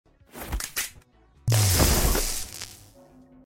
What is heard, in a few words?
gun explode